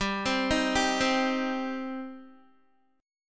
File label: G Suspended 4th Sharp 5th G down Guitar; Gsus4#5 arpeggiated